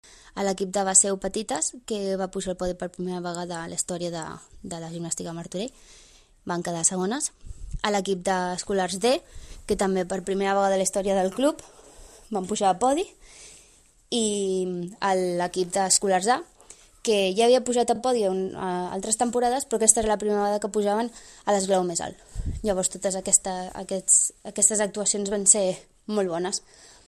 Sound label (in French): Mélodie